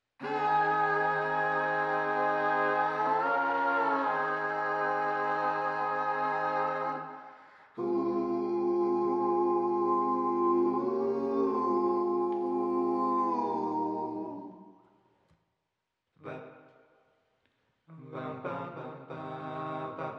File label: Choirs